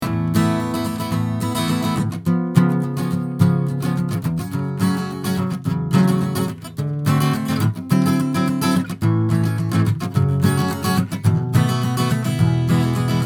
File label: strummed guitar